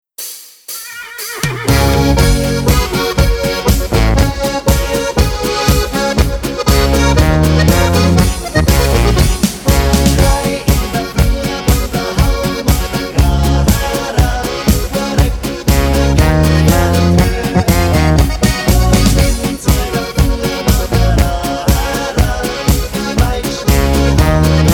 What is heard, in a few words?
KARAOKE